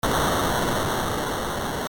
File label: moving stone; PPdastr Studio